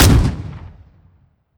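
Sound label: dpapa12 fire player, weapons; gmod; iw8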